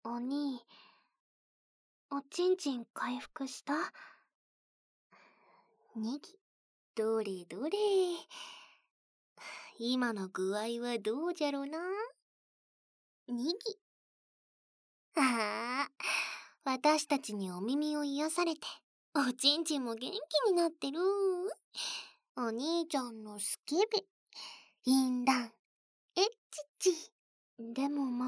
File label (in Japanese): ねっとり耳舐め, ASMR; 結合部舐めで高まりながら凛と初えっち種付け♪